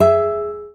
Classic Guitar, notifications

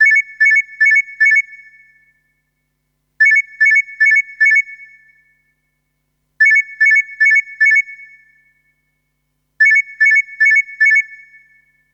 Simple Alarm, alarms; LG Optimus G F180K

